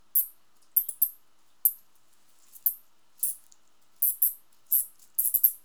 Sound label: Diplomesodon pulchellum screech; piebald shrew; A series of screech-calls, produced by an adult female piebald shrew, disturbed by a human